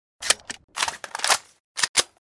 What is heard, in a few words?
vss reload, weapons